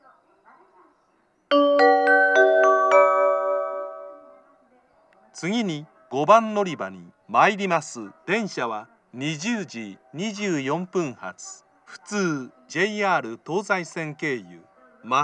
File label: amagasaki local matsuiyamate; station; jr-west